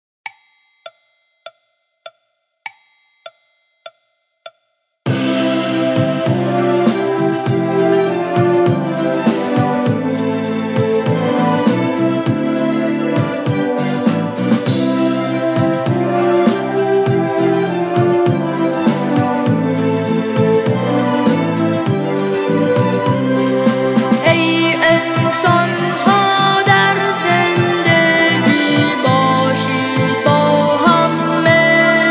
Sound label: Ode To Joy Vocal